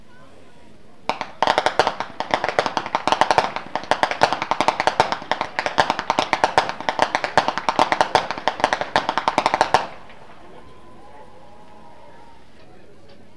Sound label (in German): schnalzen, flachgau; bergheim